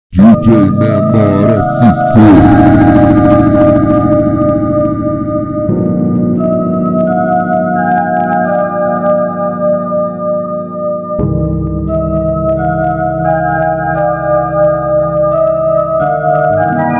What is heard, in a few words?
slowed reverb